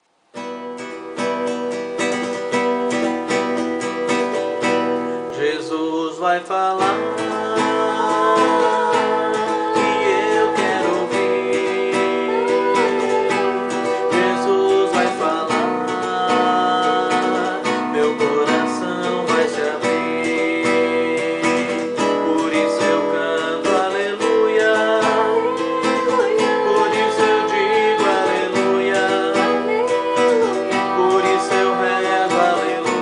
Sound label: vocal